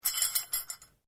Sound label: Bell Handbell Priest Japanese Ornamental Rings Rattling; The ornamental rings on a Japanese priests bell; Bells